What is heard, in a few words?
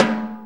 Snare B; Sonic Foundry (Sony Creative Software); Drum Tools